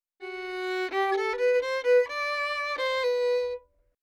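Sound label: Dry, 3-4, G major, 128 bpm, melody, violin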